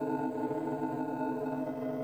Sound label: Nursery Amb Orbiter Room; Warframe; The War Within; TWW Nursery